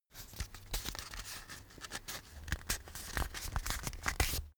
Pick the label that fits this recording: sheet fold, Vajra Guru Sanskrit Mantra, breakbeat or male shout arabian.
sheet fold